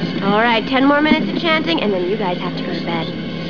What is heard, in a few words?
chanting